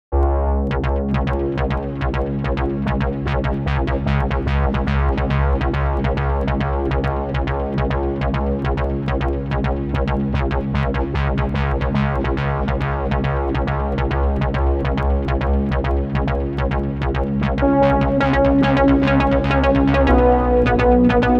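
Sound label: rhythmic menkent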